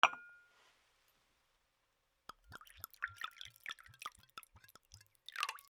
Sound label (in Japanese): ワイングラスに水を注ぐ; L40 ｜食・キッチン